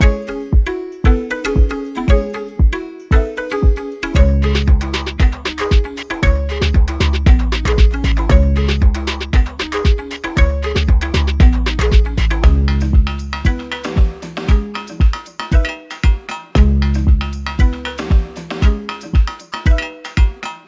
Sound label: Classic Loop loud